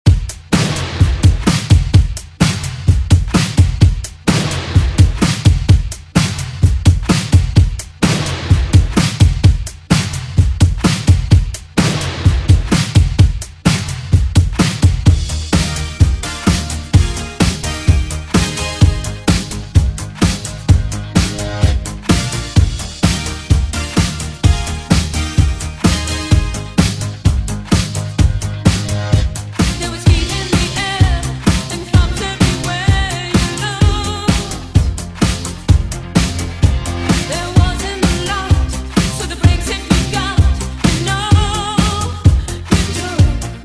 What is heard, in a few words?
Oldschool Redrum; Deejay Hit